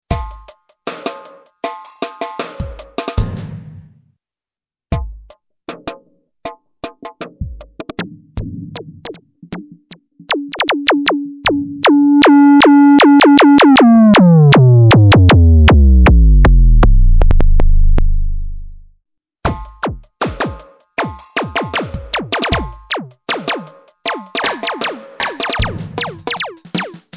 filter reso max

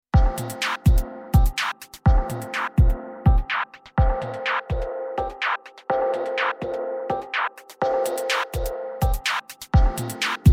korg volca sample groovebox

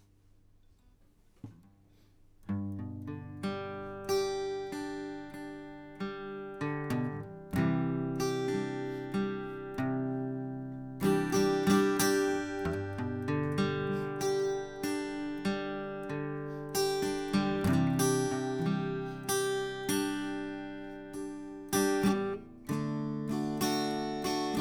Guitar; Heritage Super8

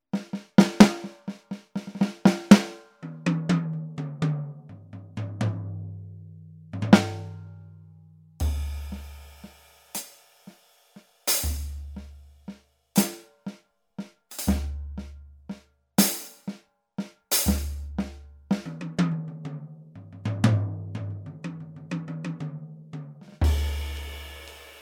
ATV a5D Kit Ultimate Jazz2; Steven Wilson Kit

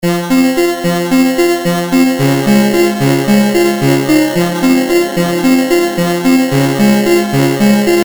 Synth Basic Waves

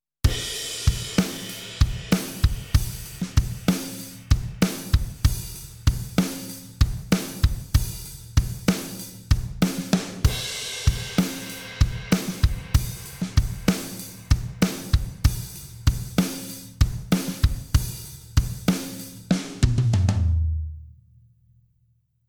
Drum Chandler EMI RS660 Limit Fast

